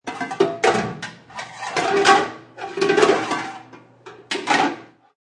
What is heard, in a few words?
Noises of pots and pans being banged around in kitchen.; Pots And Pans Sound Effects